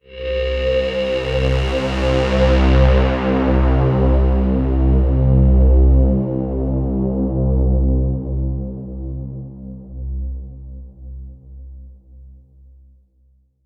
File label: Trance Sweeps; Vengeance Samples; VEC2 FX